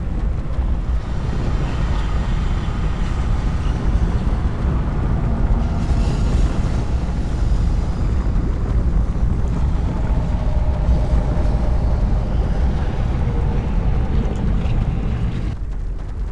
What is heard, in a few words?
volcano, ambience